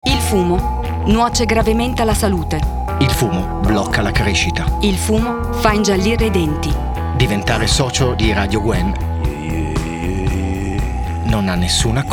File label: Jingle